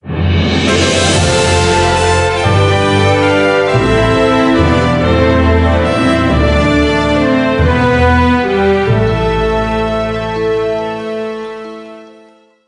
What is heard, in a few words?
Start Sting; dragonology 2009 nds gamerip